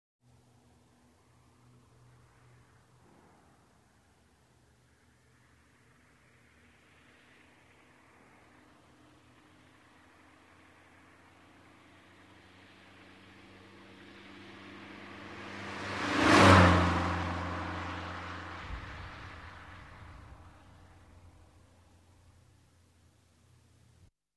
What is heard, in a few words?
Golf GTI Pass By; Sound Ideas; Series 2000